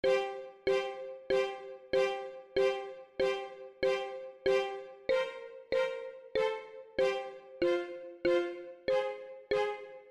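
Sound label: xylo strings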